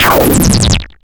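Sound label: FX right, attacks; Best Service Advanced Media Trax Modern Composer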